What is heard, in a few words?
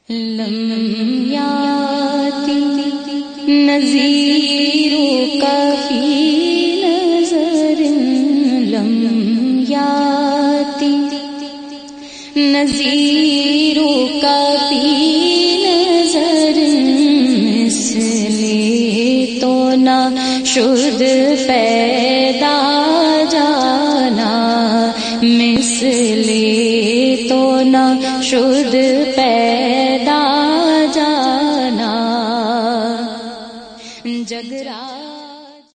islamic urdu